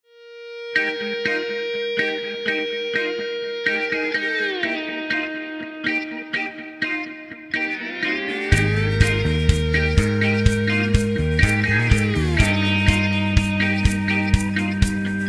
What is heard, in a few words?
Eb No Backing Vocals